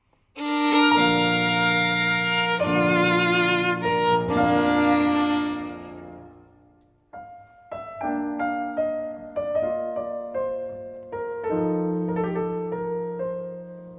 Sonate B-Dur, Largo Allegro

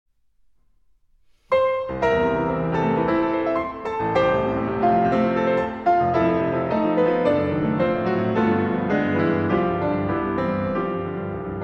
Klavierstücke in A Minor, Intermezzo. Allegro non assai, ma molto appassio; Op. 118

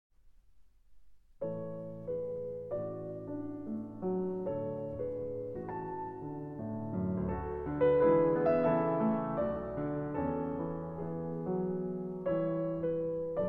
Klavierstücke in A Major, Intermezzo. Andante teneramente

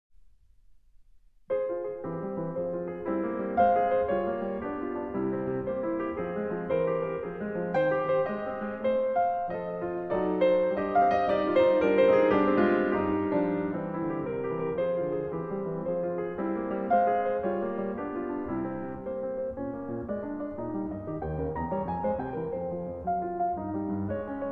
Klavierstücke in F Minor, Intermezzo. Allegretto un poco agitato

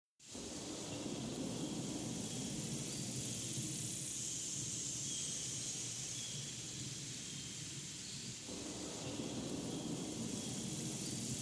Orchester Version

